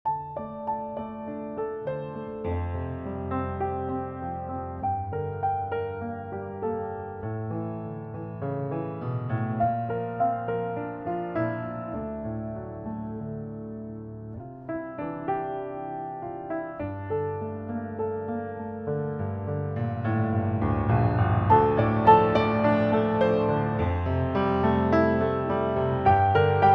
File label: Piano Version